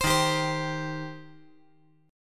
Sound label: Eb 6th Eb down